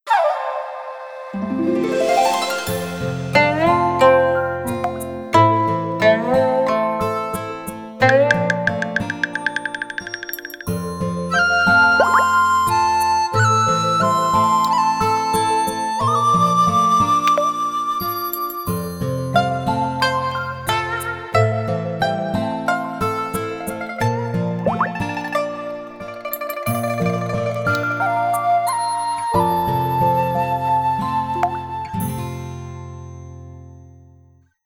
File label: Ethno, ringtones; Elephone U Pro